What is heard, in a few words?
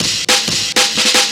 Amen Weird